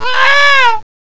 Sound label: CROW; CMI Sound Library Rev 1.3